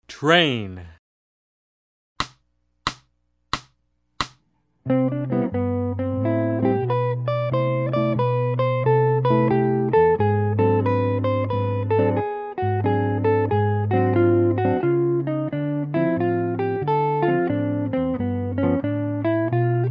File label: Jazz